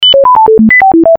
Beep Dance; Best Of N2O Library

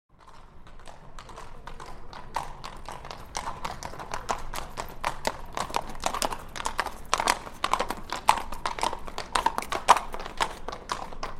Two Horses Walk By On Cobbles; Historical; Hollywood Edge Historical Series; War Horses Armies & Weapons

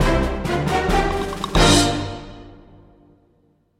Breaking News

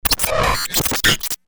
Open The Tech Door; Best Of N2O Library; Breakers & Transitioners

